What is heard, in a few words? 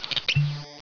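cork squeak; 12000 Graphics Pack - A Complete Graphics Collection For Your PC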